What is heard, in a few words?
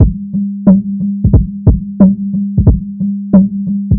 drum loop filt